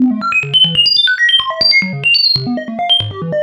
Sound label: ELECTRO EXTRA 140